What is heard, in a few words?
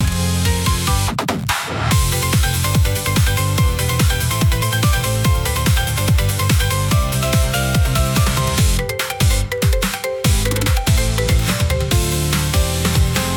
loop